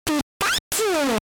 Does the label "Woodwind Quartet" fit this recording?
No